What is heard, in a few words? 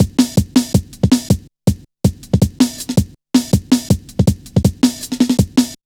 drum loop, 161.5; Zero-G Jungle Warfare 1, 2 & 3